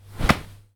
Kick Body

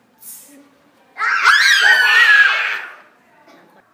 Kids Screaming

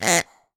Green Toy Pig Squeaking

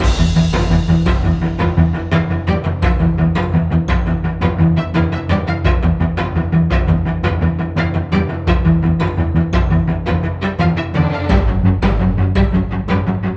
Action Music Loop

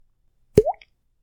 Drip Of Water Or Water Drop

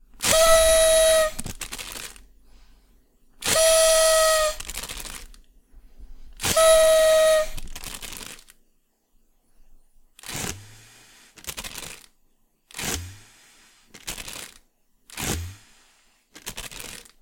Party Whistle Being Blown

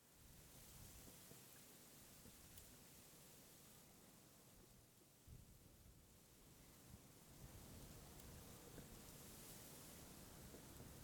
Grassy Field Ambience

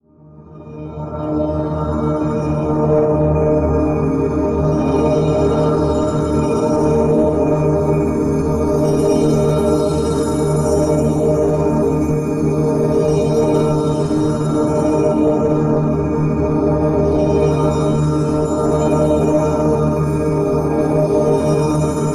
Holy Mysterious Ambiance Drone Loopable

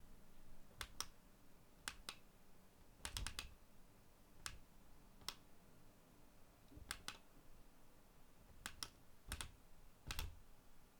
Pushing Button

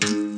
Berimbau Single Stroke

